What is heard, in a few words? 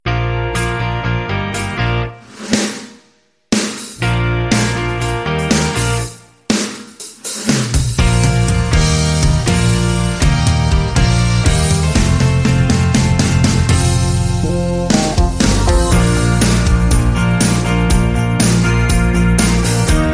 Ringtone